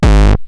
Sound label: Roland TR-909 BD; BD Selection